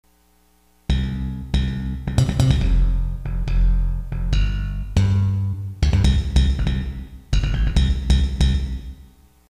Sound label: bass slap electric funk